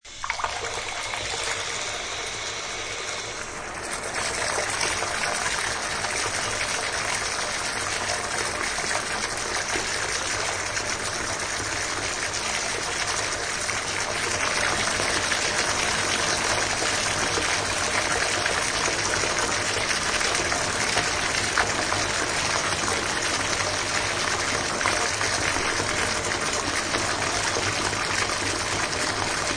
Trn On Fll Tub, Turning on bathtub faucet and running a bath.; Turn On and Fill Tub; Bathroom; Household